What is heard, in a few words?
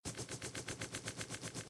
reels rotate; Corrida Romance; Wazdan